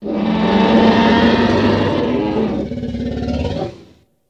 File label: Dinosaur Roar Elepha